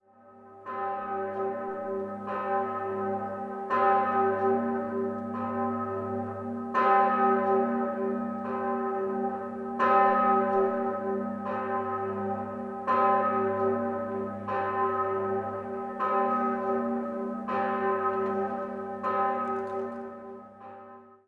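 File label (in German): grossglocke an geradem joch mit obergewicht und kontergewichtsklöppel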